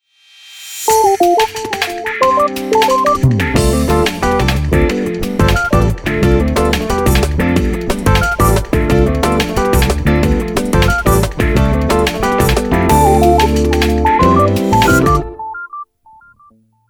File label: jungle groove jingle